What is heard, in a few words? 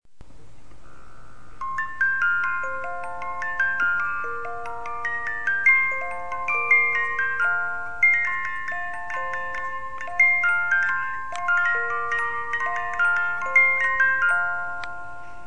Jingle bells